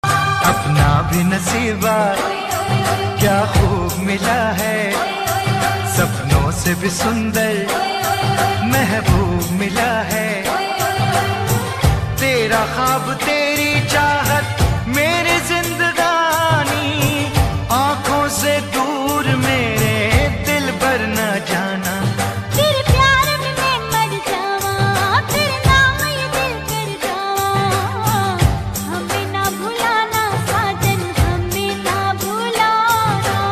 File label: Bgm Ringtone; Cirkus